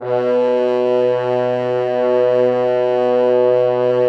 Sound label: French Horns